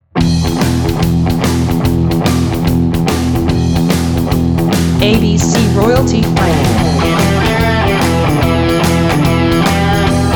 BLUES ROCK

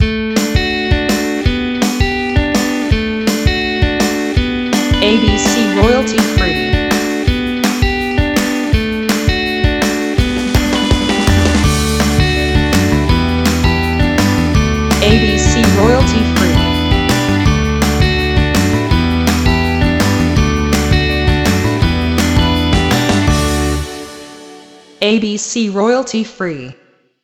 Happy Commercial short no whistler

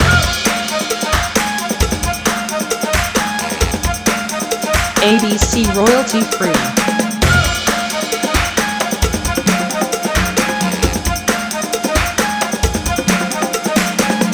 Soul Party loop

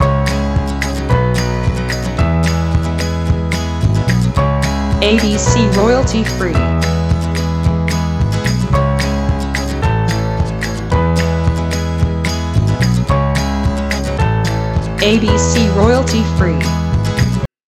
Celtic Folk loop Lo-Fi

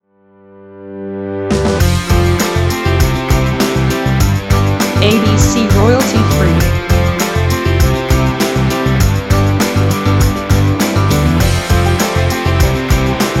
Folk Rock

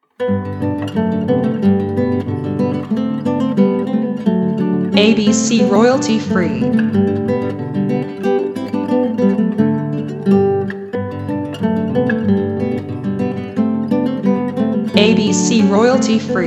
Happy Guitars

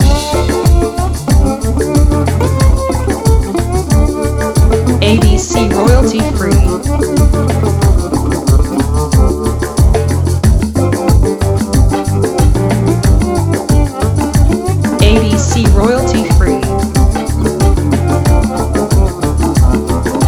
slide groove

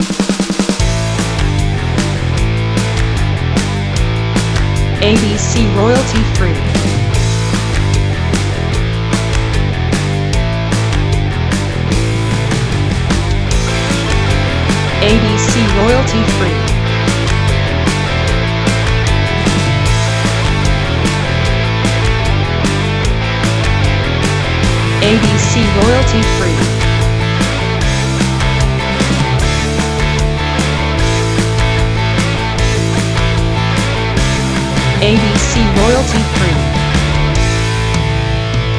Uplifting Rock

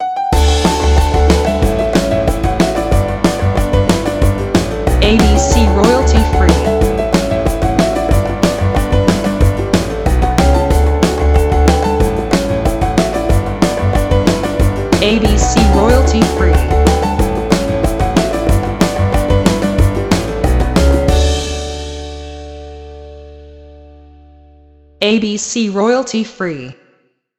Happy Piano Sitcom

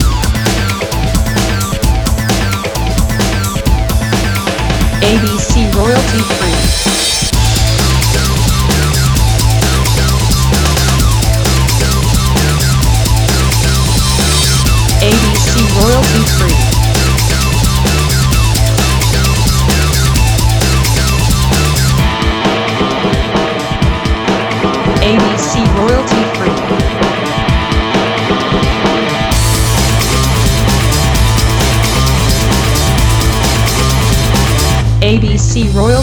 Action Breakbeat

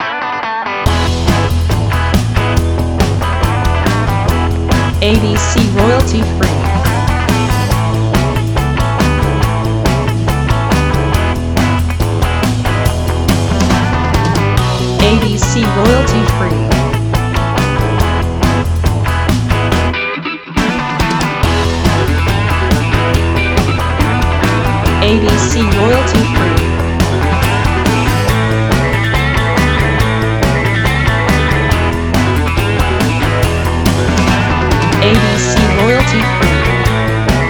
Blues Song

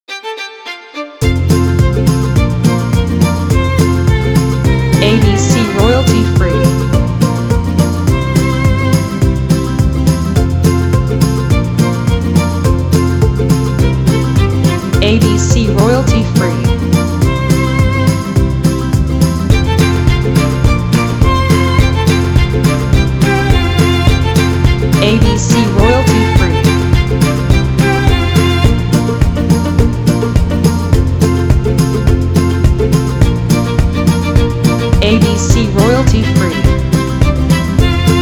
Happy Cajun Dance

